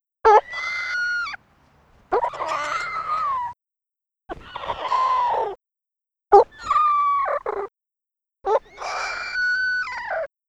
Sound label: Aerial alarm calls